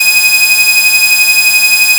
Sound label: additive cello single